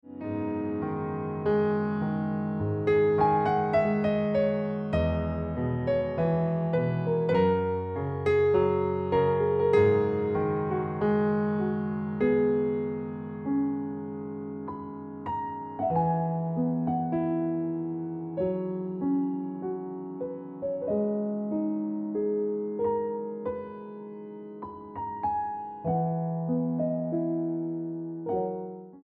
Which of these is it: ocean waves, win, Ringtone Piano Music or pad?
Ringtone Piano Music